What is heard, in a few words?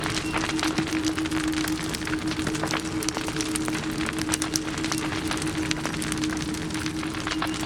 trundle q loop; LoL